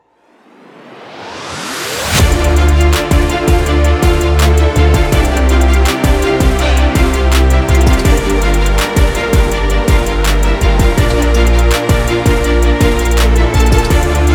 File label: tension violin; By Burak Sound Bank; horror excitement thriller song